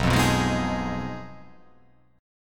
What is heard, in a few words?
B 6th Flat 5th B down, chord